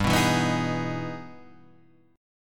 E Major 7th; Emaj7/G; strummed; JGuitar

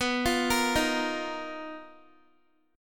B Major 7th Flat 5th B Guitar; arpeggiated

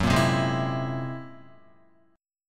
F sharp 9th Flat 5th, Guitar; 1,1,0,1,0,0; 4F,4A,5C,5G,5C,6E; strummed

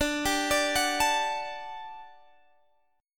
G Major 7th Suspended 2nd D

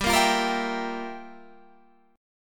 G Minor 13th G down Guitar Open G

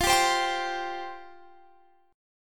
F Suspended 2nd F down Guitar Standard; Fsus2; strummed; capo=2

